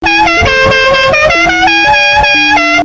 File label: Dixie Air Horn